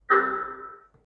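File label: windows xp error sound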